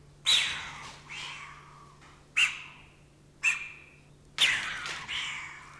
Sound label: Ciconia boyciana aggressive